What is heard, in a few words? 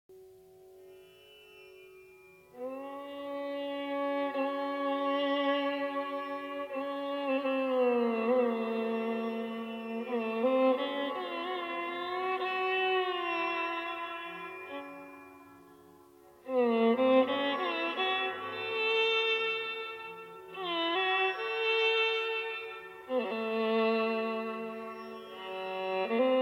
Instrumental